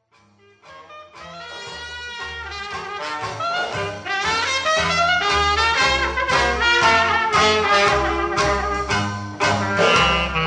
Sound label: Blues